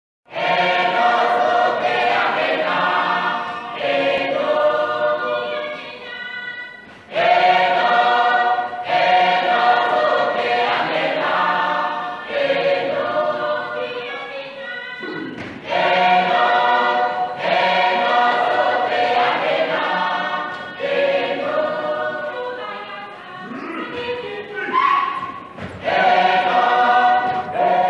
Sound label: Oshiwambo Gospel Song Namibian gospel song; Ehangano songs